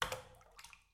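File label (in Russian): вода капля удар и плюх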